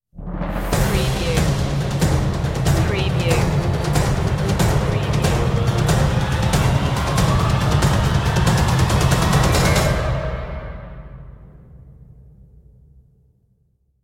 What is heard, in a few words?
treasure map riser